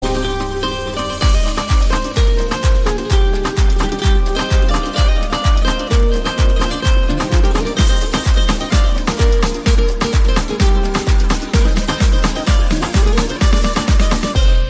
guitar ringtone; samsung

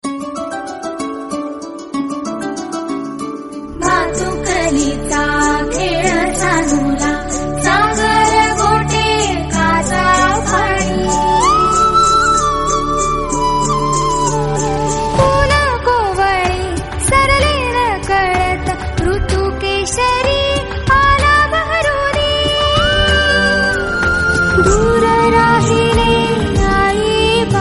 marathi sad tune ringtone

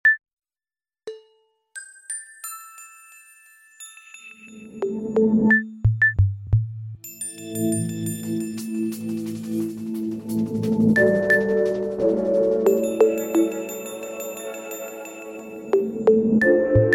nokia clock ringtone

